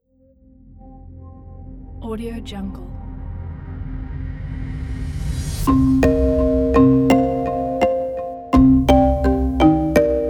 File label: mysterious adventure; colourtunes